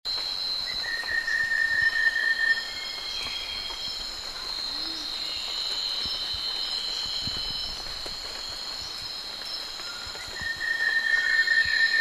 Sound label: white rumped robin